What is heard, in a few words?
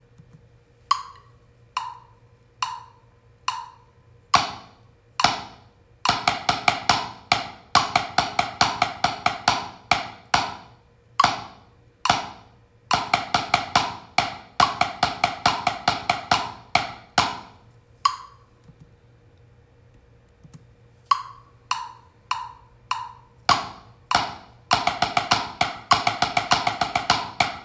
sixteenth, drums